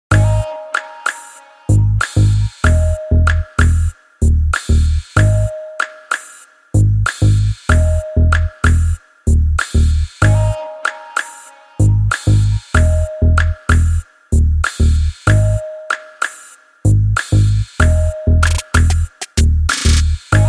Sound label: Beat